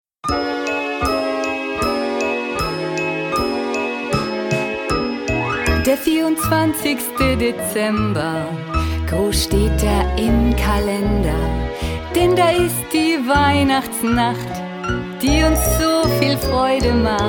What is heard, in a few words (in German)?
Gesang